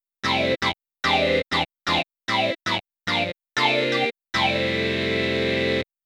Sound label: filter; Wiwi Digital music technology